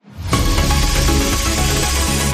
Total Settle Sound

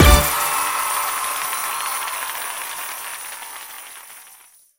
Total Settle Sound End

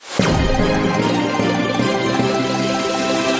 Total Win Bgm

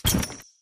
Feature Buy Sound

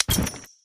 Feature Buy Sound

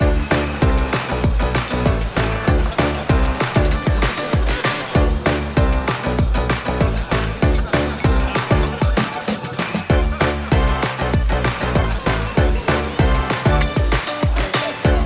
Free Mode BGM

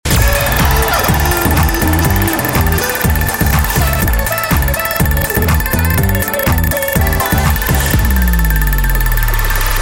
Mega Win Sound

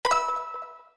Win Eliminate Sound

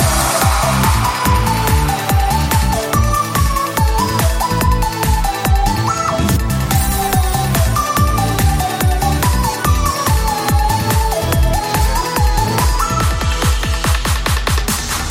Super Mega Win Bgm

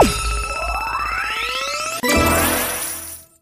Total Settle Sound

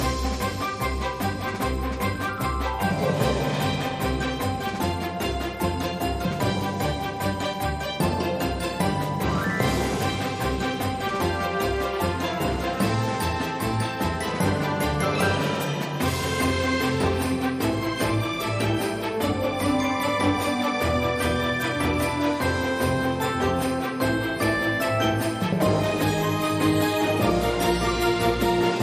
Free Bg Bgm

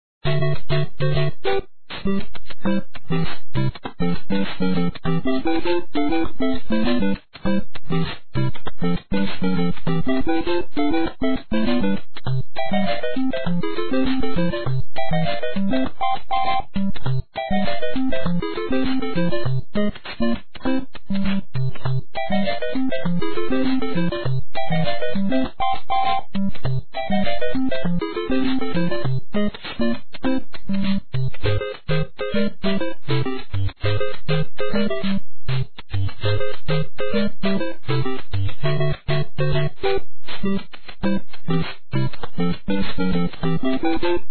Free Bg Bgm

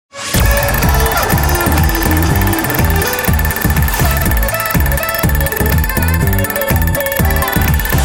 Big Win Sound

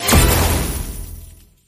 Win Settle Sound

